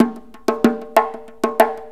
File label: 6-8 Turkish Drum, Perc Loops; Drum Tools